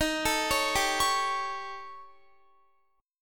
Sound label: Eb 7th Flat 5th, Eb, Guitar; arpeggiated; x,18,21,18,19,21